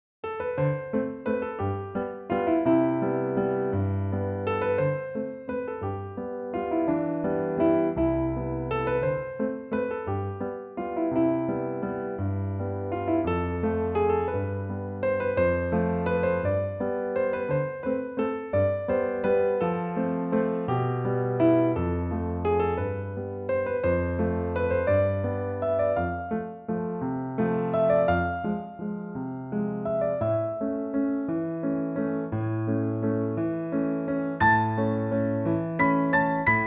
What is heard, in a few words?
Piano Pieces Whistle Song Waltz